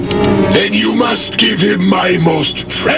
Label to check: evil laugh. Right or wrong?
Right